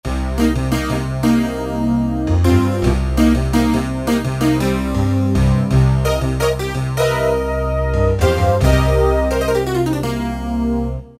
Harpsichord Stabs, Roland D-50; Factory preset demos

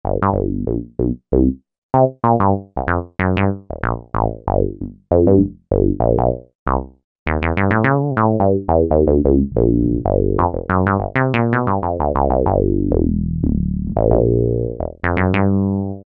MG Punchbass, Roland SRX-07; Roland SRX-07 Ultimate Keys

